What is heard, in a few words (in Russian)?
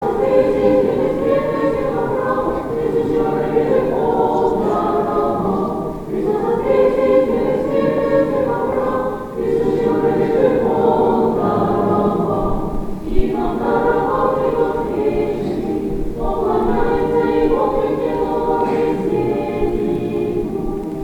Hor Hristos Voskrese hor; Hor prihoda hrama prepodobnogo Sergiya Radonezhskogo